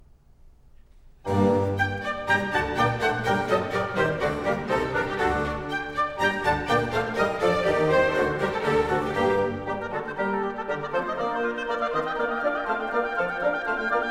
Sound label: Concerto Grosso in B flat major; Handel Masterworks; Klassika